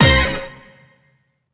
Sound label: Sfx Countdown End; rayman jungle run android ios mobile windows gamerip 2012; Lums